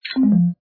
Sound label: Incorrect